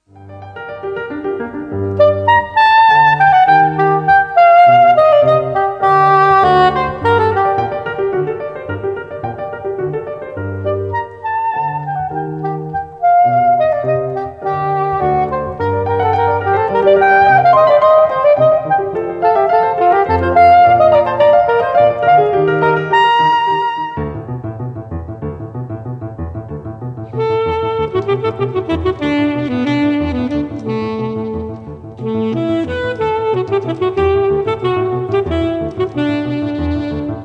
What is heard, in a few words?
saxoforte